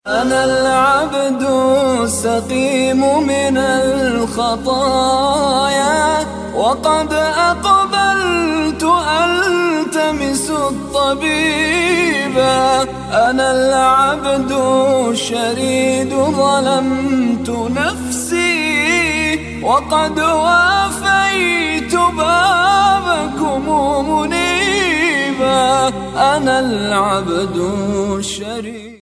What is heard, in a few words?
arab ringtone